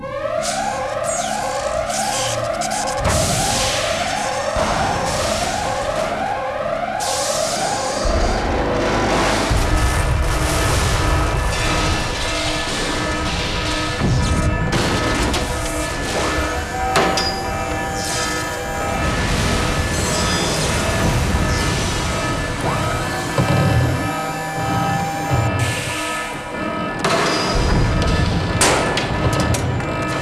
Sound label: Prototype Ambient Robot Interior Destroyed; rayman raving rabbids playstation 2